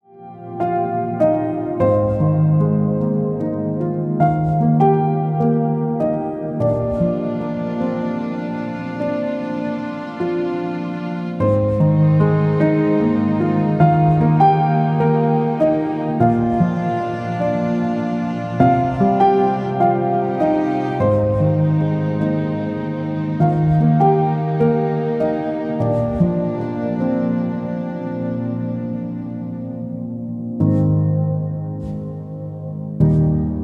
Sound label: Acoustic Version